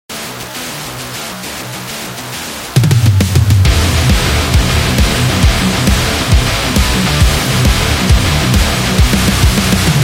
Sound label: Bodybuilding